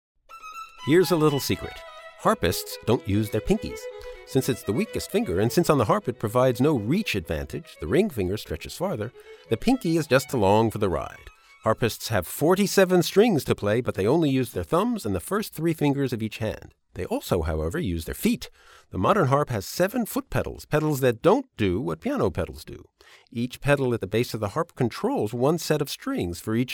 harp

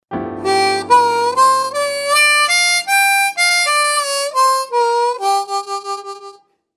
blue note scale

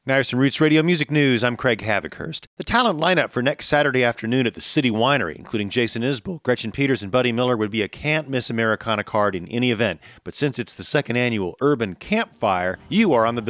guitar mash

